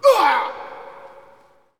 Hockey Male Hurt; SFX Kit; Hockey Vocal Sounds

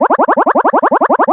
Arcade Movement, Video Game Sounds

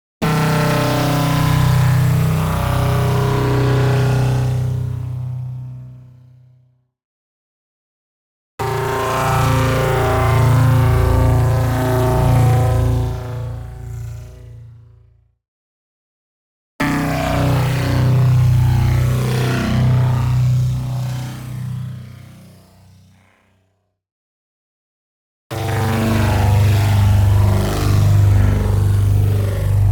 BLAST Post Auditory Welding; NEON; boom library

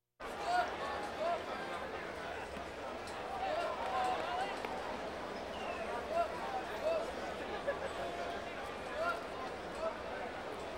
Crowds Race cars Baseball

